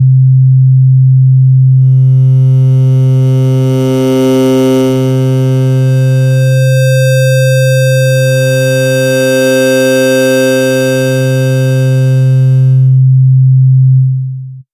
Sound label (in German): OSC Eigene Wellenformen